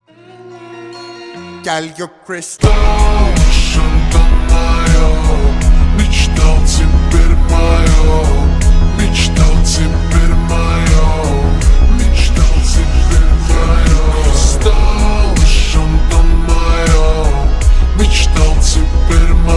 retro slow cover

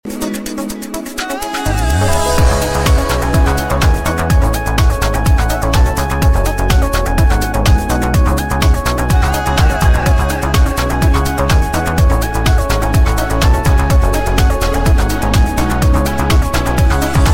trance mix, ringtone

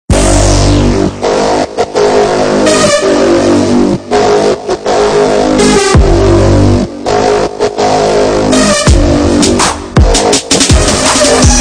speed beat

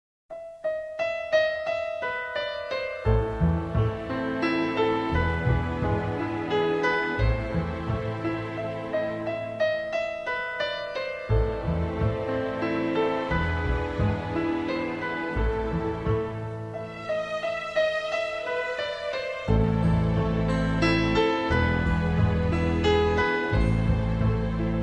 fur elise piano

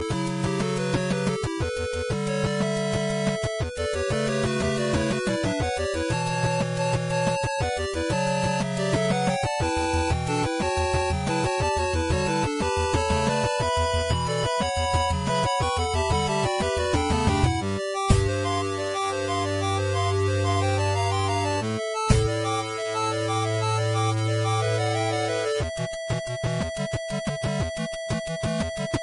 8 bit theme; halo combat evolved

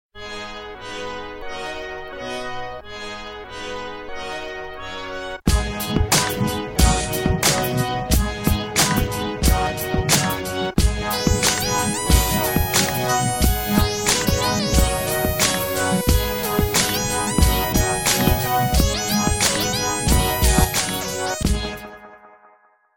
hip hop

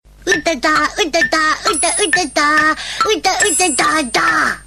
cartoon

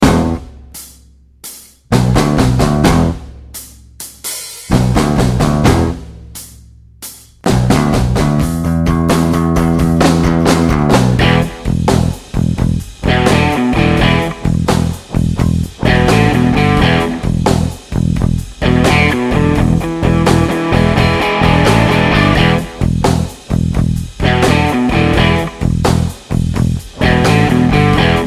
blues song

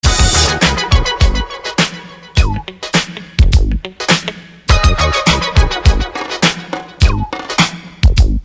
old school beat

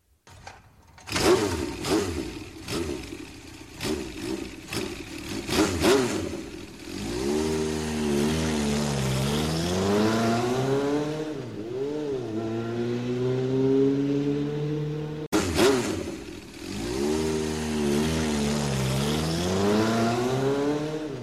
3d yamaha engine

